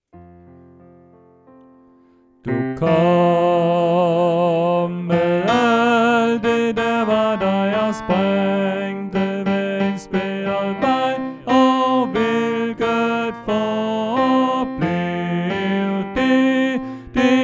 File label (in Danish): Tenor; oevefiler